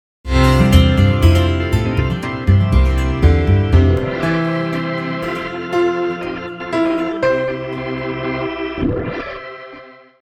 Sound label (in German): Jingle Projekt; steinhundert